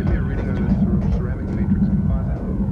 Engineering Sounds; trek

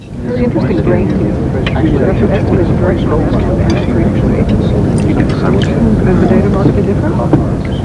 Mess Sounds; trek

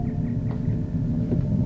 Bridge Sounds; trek

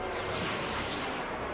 Phaser Fire; Runabout; trek

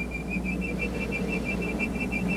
Tricorder; trek

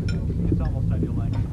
Mess Ship Hum; trek

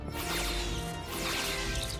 Phasers; Bajoran; trek

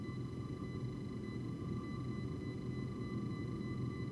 Tricorder Wall Panel; Trek

